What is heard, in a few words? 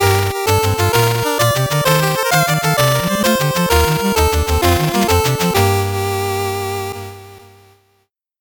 8bit jingle slow